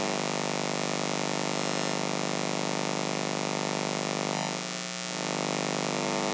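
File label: ambient electric buzz loop; Half Life Model Pack; Half Life Alyx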